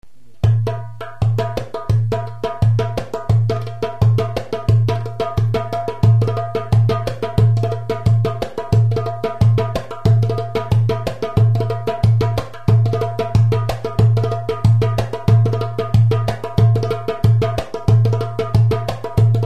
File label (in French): darboukka seule; fezeni